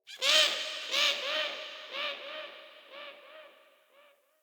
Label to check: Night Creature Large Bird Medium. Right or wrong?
Right